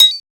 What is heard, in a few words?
hi-percussion, techno; Techno ID; single-drums